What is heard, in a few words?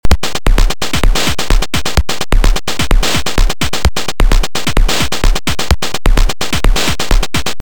iphone nanoloop drumkit for lsdj